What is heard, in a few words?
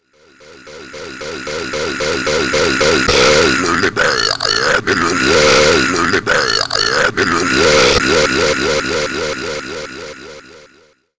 Burp Super Deluxe